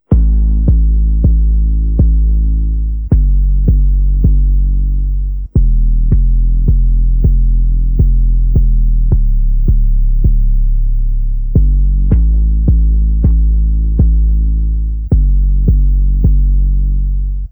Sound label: bass